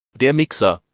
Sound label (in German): Mixer; karteikarten; kueche